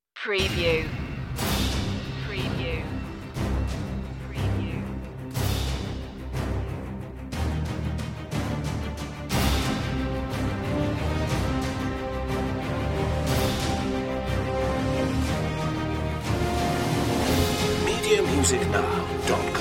choir no solo voc

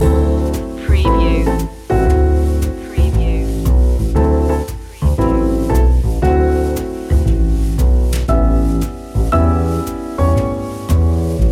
silent night jazz long loop

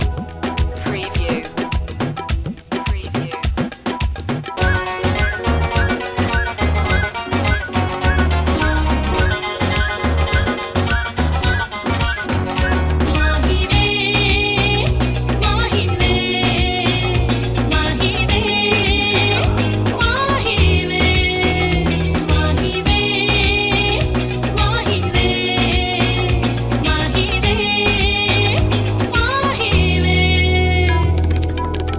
bollywood reggaeton loop; Royalty free music with Indian influences ideal for creating an exotic ethnic feel.

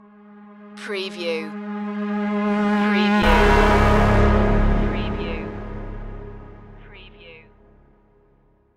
bionic animal stinger

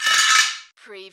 Button Sound - Metal Plate Drag

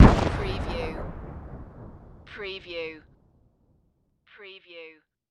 Artillery Shell Distant; sound effects bible

